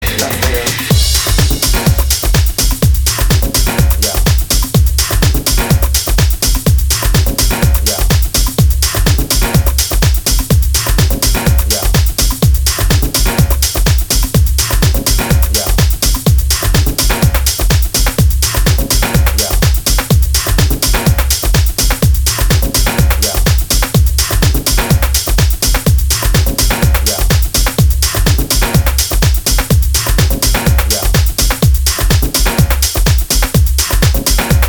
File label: Ringtone